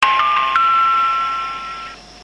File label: nankaibus, chime; 南海バス; 南海バスでは路線バス、高速バスともに降車ボタンを押すと、それに連動して車内スピーカーからチャイムが流れます。